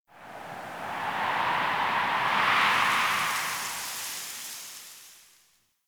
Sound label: Wind Gust Small; Ambience; Warframe; Eidolon Plains